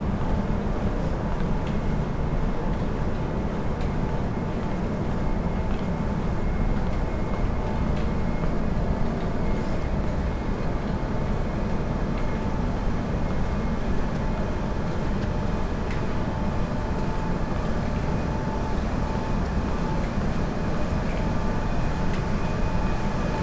soundscape paranal tour; UT1; UT2

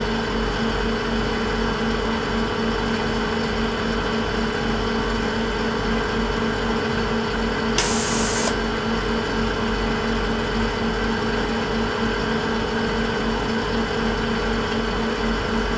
La Silla ESO 3.6-metre-telescope HARPS; soundscape

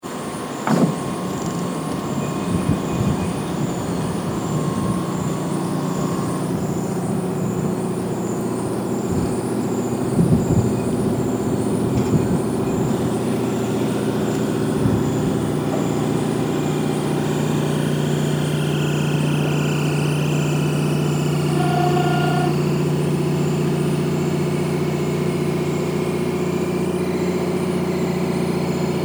paranal at dome closing, soundscape; Paranal Auxiliary Telescopes (ATs) dome closing; mono

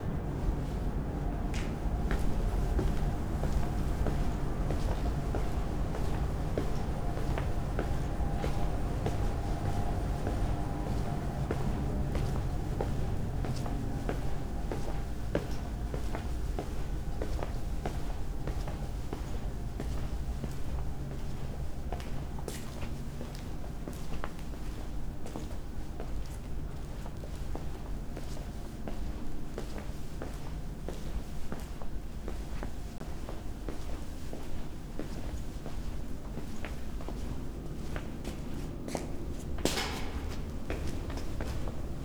3.6-metre-telescope 3rd floor; La Silla ESO; stereo; soundscape

